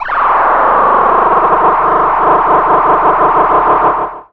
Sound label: scifi mega blast; Computery or Science Fiction